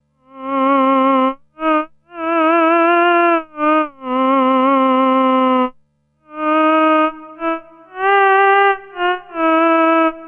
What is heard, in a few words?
theremin